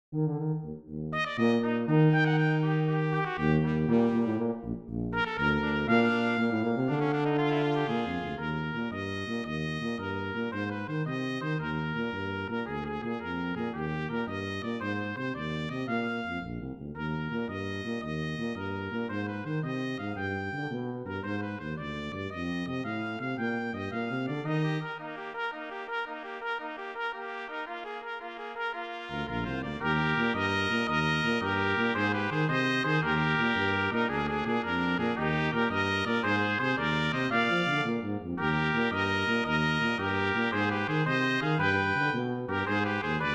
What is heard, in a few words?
trio version audio; brass trio; Sailing Overture